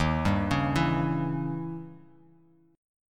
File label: D sharp 7th Flat 9th, D sharp, down; arpeggiated; JGuitar